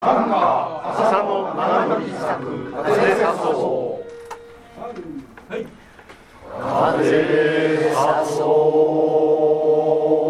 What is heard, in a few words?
gogin men; tanka